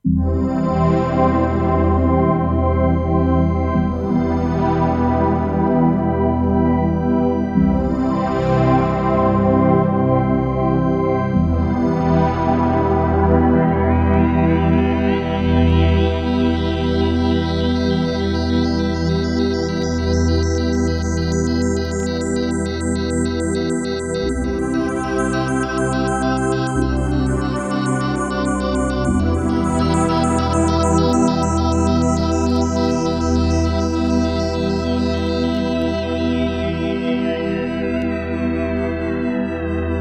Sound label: FM Heavenly Pad